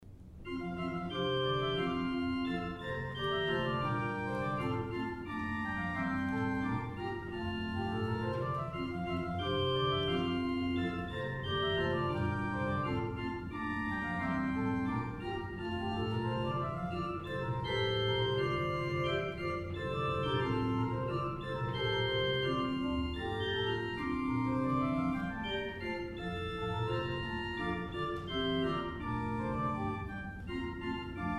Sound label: Clog Dance; Selah Recordings